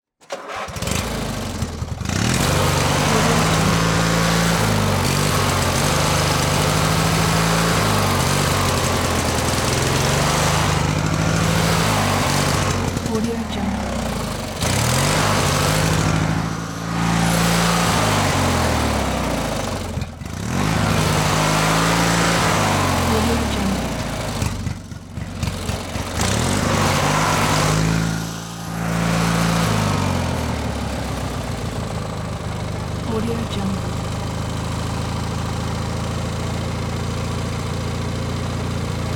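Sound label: motor running maneuvers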